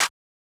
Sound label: Clap